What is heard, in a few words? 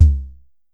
Drum X Tom, Pearl